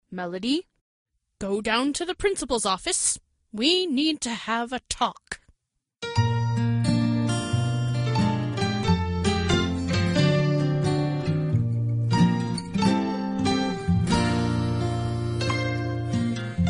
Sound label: melody